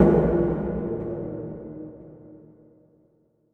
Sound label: metal hit far; soundscapes; occultists